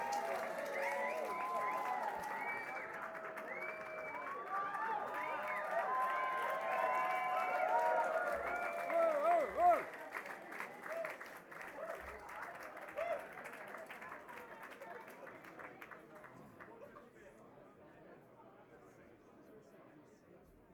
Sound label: Audience